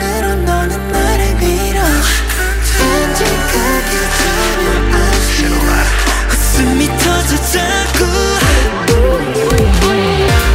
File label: ROLLER COASTER